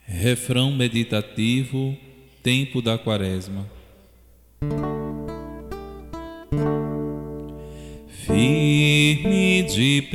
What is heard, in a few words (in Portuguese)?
refrão meditativo quaresma